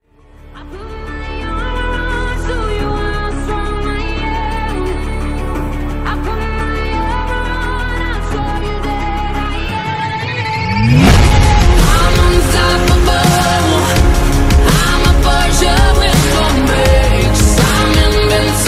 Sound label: ringtone bgm ringtone